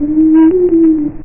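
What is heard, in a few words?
swamp, ambient; Quake; PainKeep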